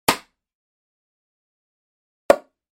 Fisted Board Tap; Hollywood Edge Martial Arts and Human Impacts

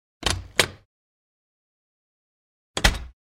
Refrigerator Door Opens & Closes w Latch Click; Household

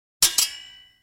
Double Sword Scrape; Fights Bodyfalls

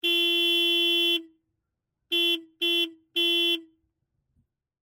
Porsche 912 Horn; SOUND EFFECTS1

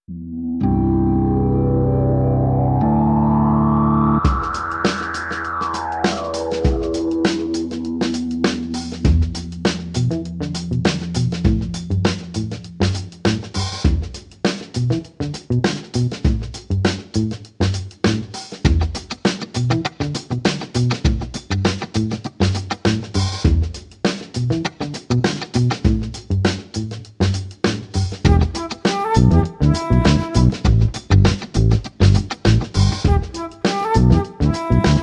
Electric Funk Break

